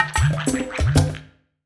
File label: Frog; Sony Sound Forge 7; Acoustic Mirror Impulses; Synth